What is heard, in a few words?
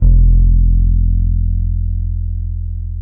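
F#2, French fretless bass; Spectrasonics; Bass Legends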